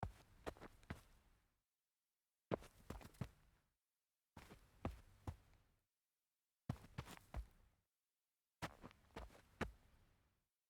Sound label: sneaker turnaround dirt